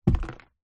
household trash can small plastic drop or knock over